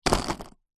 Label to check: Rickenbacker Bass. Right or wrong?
Wrong